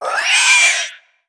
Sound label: death, headcrab, creatures; headcrab classic; vox